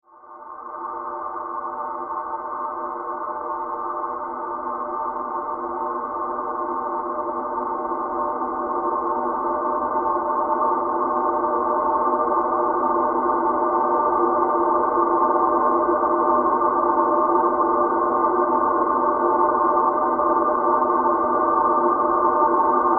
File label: Horror Drone Airy Build; Evil Sound Effects; Evil (Sound Effects:Horror); SFX Bible 6